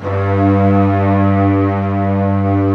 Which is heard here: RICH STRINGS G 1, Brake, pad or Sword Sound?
RICH STRINGS G 1